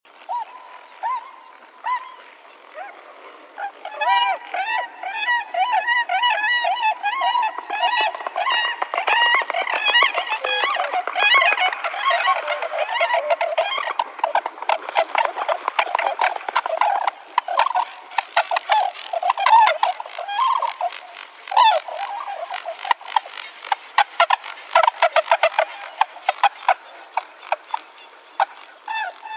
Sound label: tundra swan; emiquon virtual tour; birdwatching; illinois